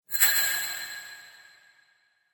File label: Magic Illusion; Magic Sound Effects